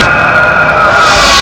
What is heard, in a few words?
FLUSH; SoundFactory; FX